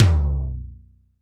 TD-20 Tom A, Roland TD-20 Toms

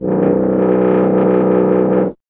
tuba; Miroslav Vitous - Solo Instruments VOL-2

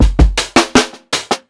PICCOLO 80 16TH BEAT, DRUMS LOOPS; BASEMENT DRUMS VOL 2

